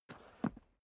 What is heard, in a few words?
step boots concrete scuff; menschen; schritte